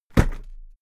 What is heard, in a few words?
briefcase plastic drop hard; industrie wirtschaft; bueroarbeit